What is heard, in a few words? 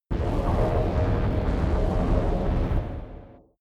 space inside biomedical alien spaceship working